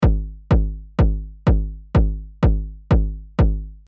Short Decay

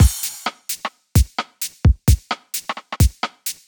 Kick Snare Rim Shot Hats and Cymbals

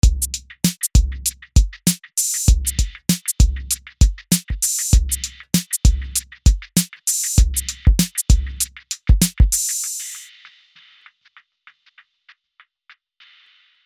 classic uk hip hop

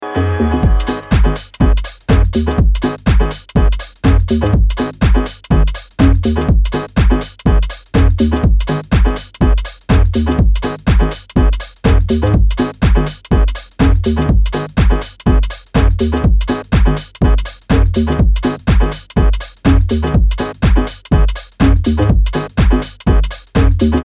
disco

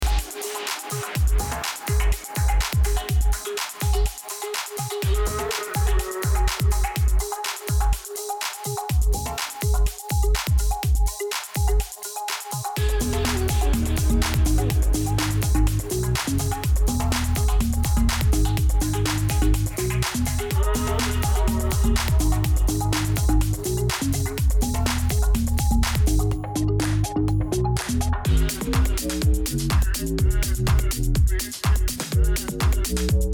API2500 Inf to 1 10dB comp

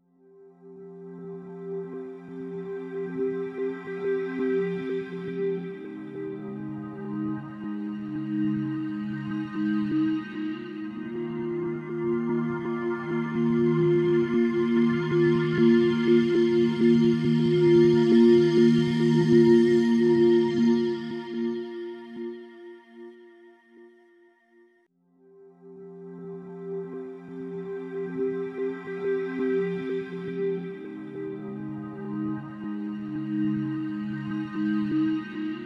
stretch

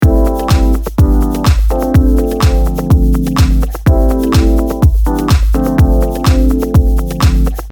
Deep House Example

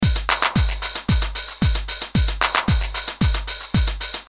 Drums

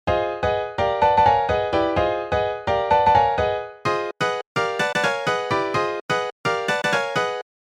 House Piano Chords

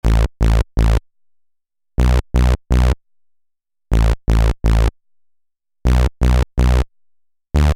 minitaur house

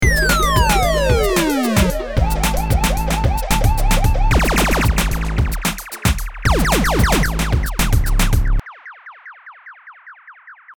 Dub Siren

